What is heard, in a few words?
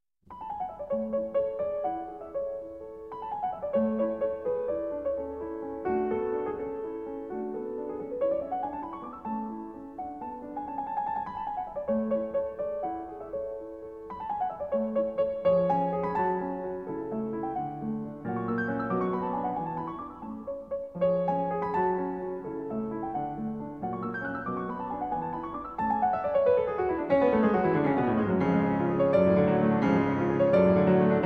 Piano Sonata No. 13 in A Major; D. 664; Schubert